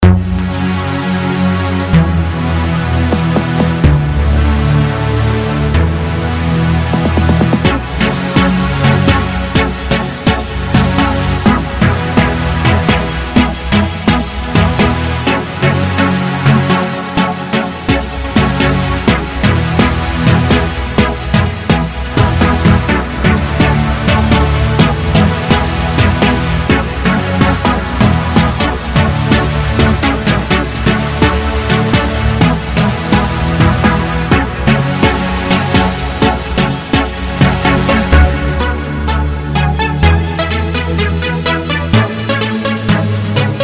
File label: techno test